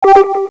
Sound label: brightness down; Samsung SGH-Z540